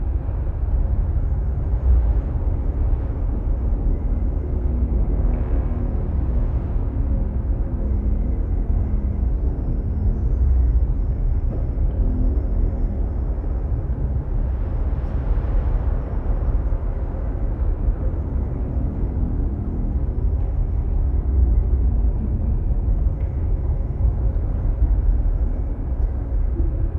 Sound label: ship battleship idle; Stellaris; shroud; ships